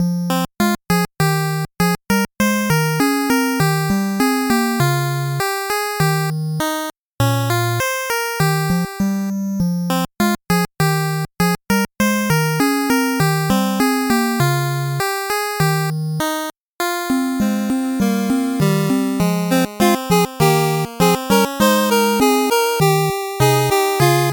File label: 8bit bgm slow